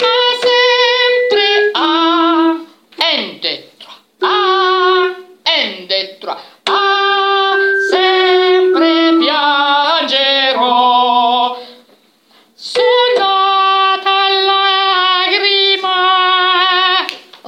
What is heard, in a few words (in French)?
mesure 13 alto